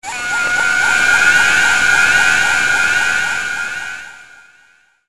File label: Scream Echo; Skull Kid screaming (heavy echo, edited to fade out)